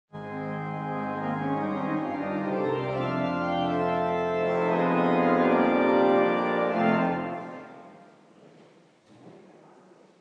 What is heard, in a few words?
christmas morning organ interlude; with baby Jesus